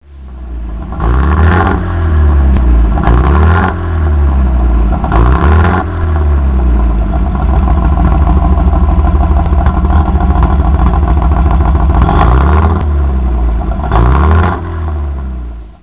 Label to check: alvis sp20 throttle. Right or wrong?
Right